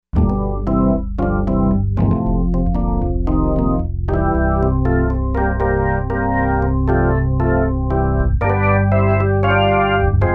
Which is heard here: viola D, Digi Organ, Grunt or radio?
Digi Organ